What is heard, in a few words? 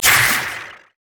voidwalker forcepulse; kassadin